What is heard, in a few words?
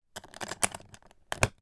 foley flightcase drawbolt catch lock